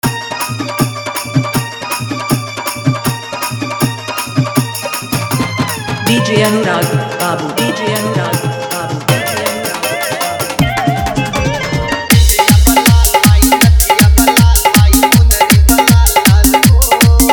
Devi Geet Dhollki Bass Mix

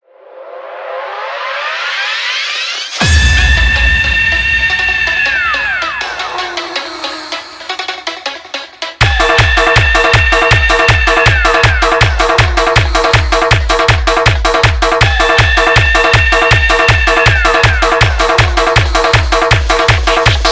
Desi Full Bass Dance Mix

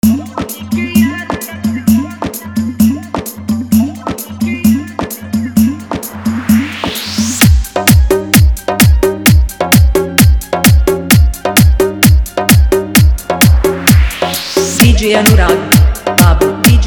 Bhojpuri Dj Mix

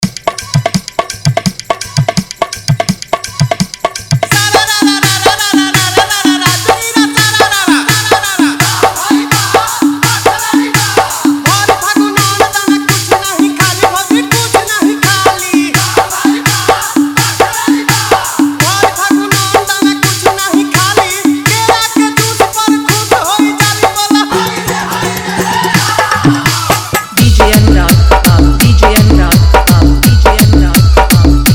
Hard Mix

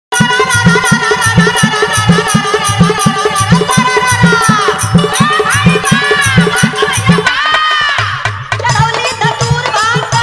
Full Bass Dance Mix

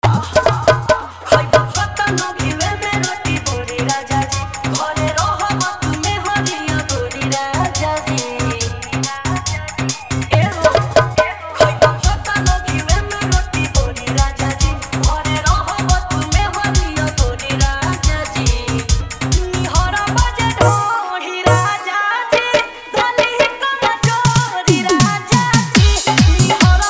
Bhojpuri Dj Song Mix

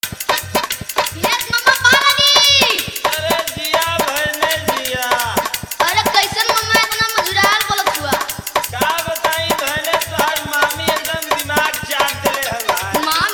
Holi Faadu Dance Bass Mix

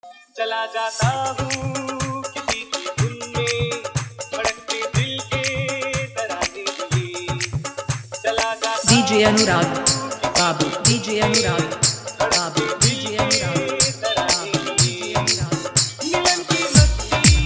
Electro Mix

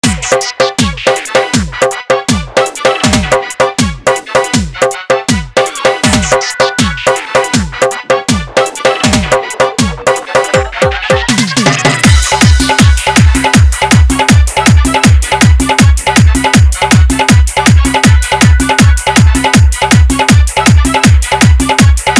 Dhollki Bass Dance Mix

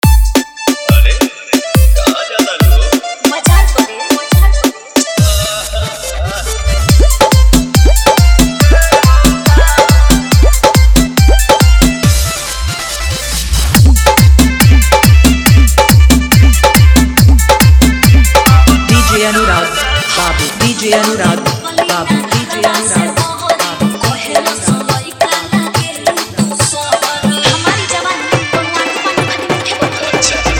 Kick Hard Mix

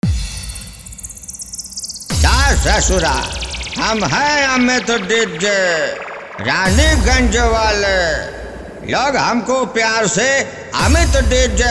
Competition Beat; Amit Dj Raniganj

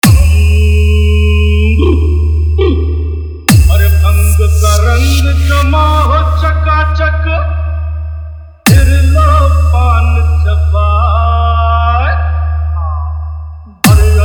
Full Electro Bass Garda Wedding Dance Remix

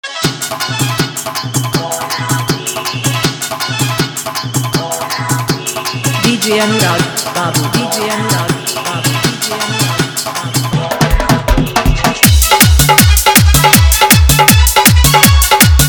Old is Gold Full Dholllki Dance Mix

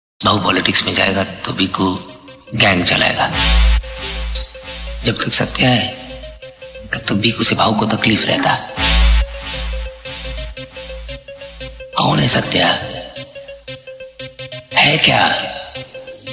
Dialogue Remix Trap, Hard Trap; Satya; Bhau Mamuli Admi Nahi Hai; Biku Mahatre

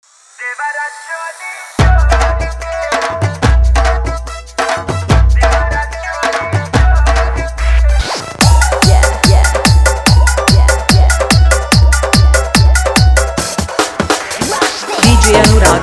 Faadu Full Bass Club Dance Mix

